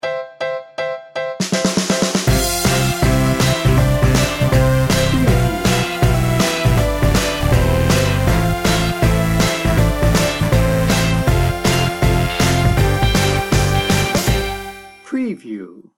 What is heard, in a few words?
motown magic